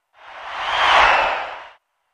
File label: Football Crowd Dopp, sports arena football